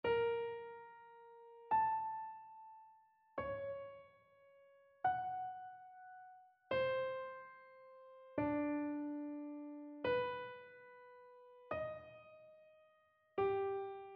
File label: row dictation; ear training